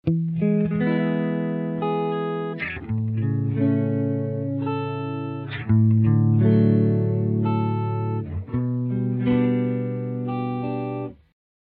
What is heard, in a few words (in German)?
Gitarre bearbeitet Akkord Editor; Melodyne 5